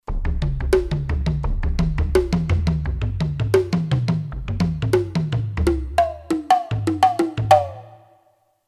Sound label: Hand Drums, Kurzweil K2500X; Factory Programs